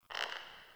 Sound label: Cork Creak; Neathuns; Puzzles; Bean Stalk